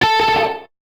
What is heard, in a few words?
Guitar Note; Curse Crackers For Whom the Belle Toils